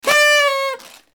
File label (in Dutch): feesttoeter